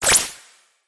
cartoon anime hit burst zap spark

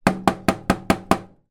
impact metal panel knocks x6; zapsplat

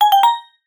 app alert tone

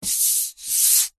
wood smooth hand rub; foley; zapsplat

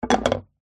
foley wood small pole down on plastic table; zapsplat

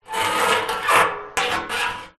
metal rusty pole scrape on metal oil drum; foley

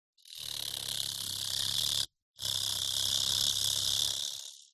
fantasy fairy fly close up wings flap fast

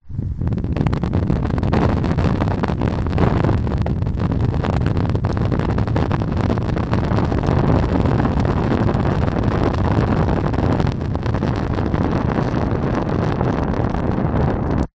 fire flame small burn blow from cigarette lighter; nature

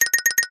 cartoon blink shake flutter high pitched; zapsplat

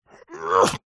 human male voice grunt groan lift heavy weight

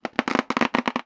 foley balloon rubbing squeak; sound effects one

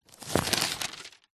household book telephone directory page turn

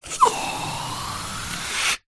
balloon inflate blow up

